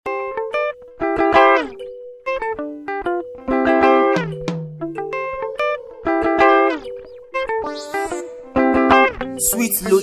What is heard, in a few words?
sax dance guitar